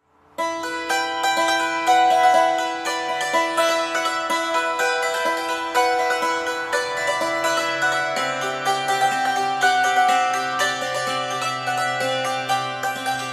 cymbaly

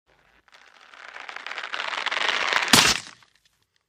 verevki; Звуки рвущейся веревки или каната, натягивание и связывания для монтажа видео

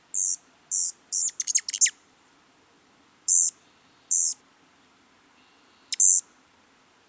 kolibri

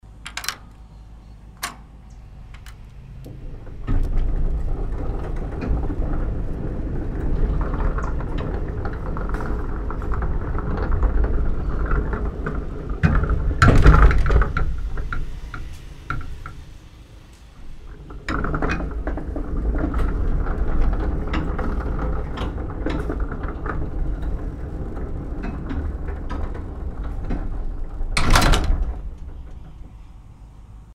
zvuk vorot otkr i zakryt

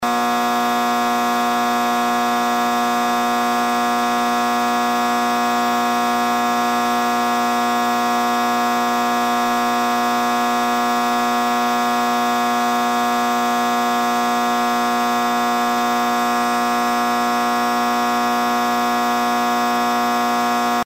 elektrotransformator

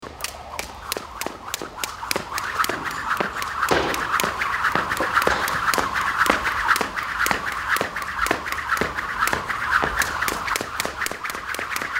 skakalka

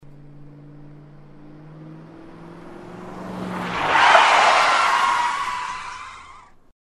mashyna priblijaetsya i tormozit

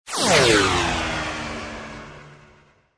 Raketa proletela mimo v kosmose

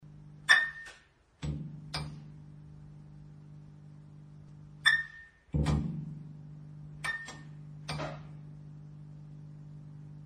klacanie luminesc lamp

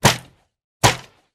vystrel arbaleta; minecraft

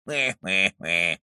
smeh multyashnyi

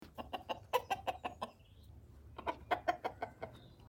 petuh golos

